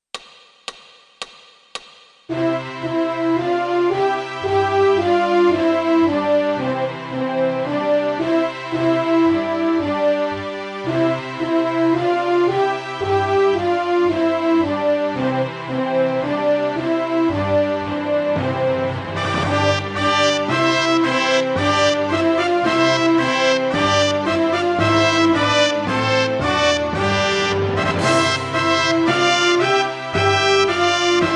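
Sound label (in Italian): Inno alla gioia, Tastiere Fisarmonica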